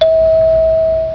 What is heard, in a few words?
ding